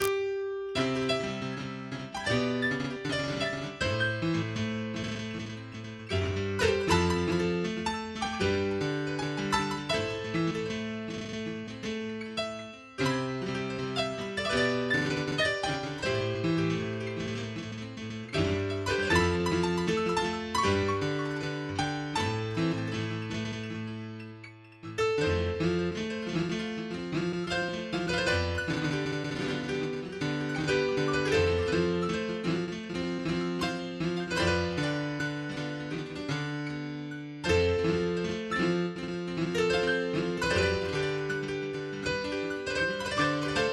Flute Cover; Naruto

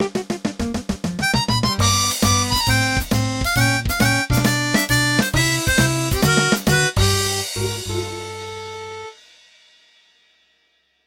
Game Over Music

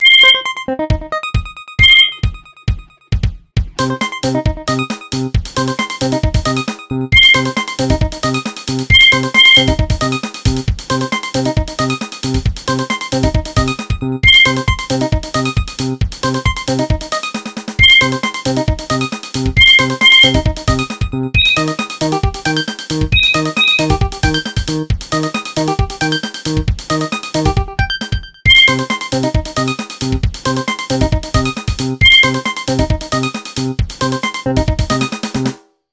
Nokia Disco

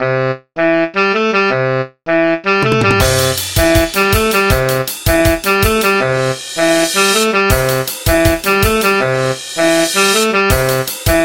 BGM Sax Quartet Remix; Donkey Kong 25m

